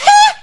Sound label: spy pain sharp, voice packs; spy jackson